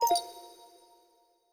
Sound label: Speech Sleep; Windows Vista Beta 2, RC